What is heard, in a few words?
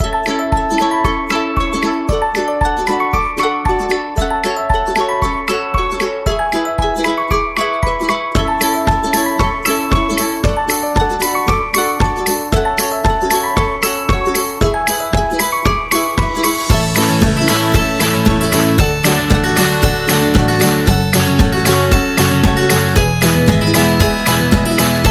Happy Upbeat; cooking show